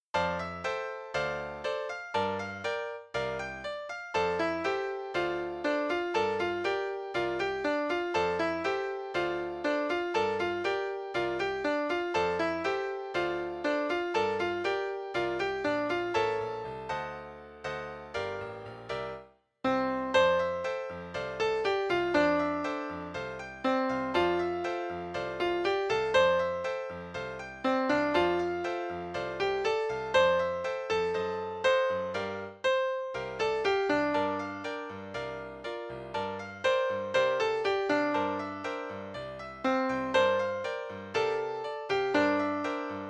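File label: Soprano piano 120 bpm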